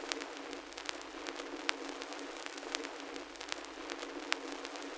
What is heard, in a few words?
Low Passed Crackle, Vinyl Noise; noise hiss crackle